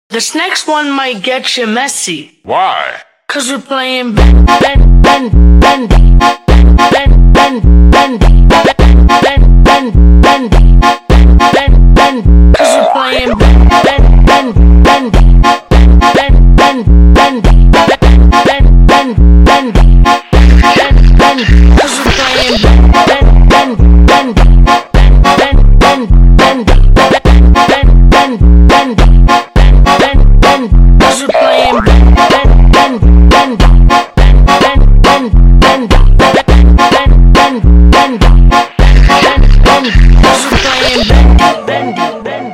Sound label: Bendy Funk super Slowed